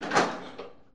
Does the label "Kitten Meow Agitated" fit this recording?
No